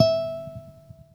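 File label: classical solo, guitar; Greytsounds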